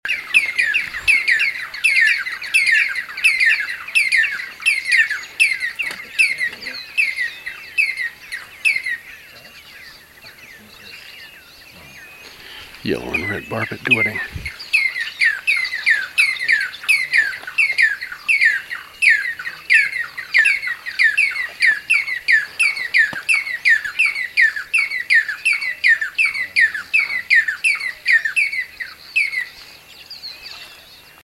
red-and-yellow barbet, duetting

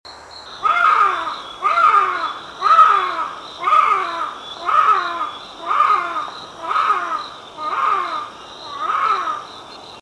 Thick-tailed Bushbaby call

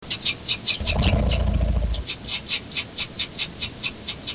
indiv chirp wing, vswift

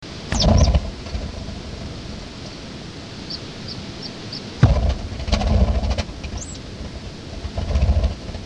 wings learning, vswift

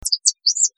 indiv song vswift

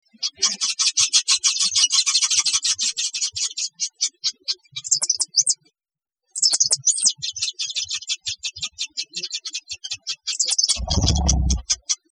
indiv callsong wings vswift

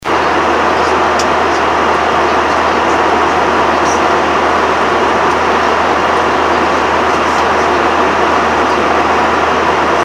Airlines seat 1A ambient noise

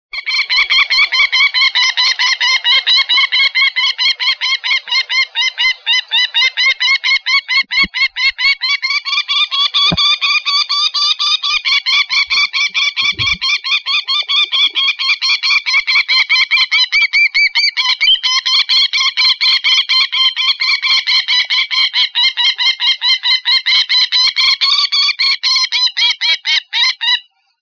brown falcon

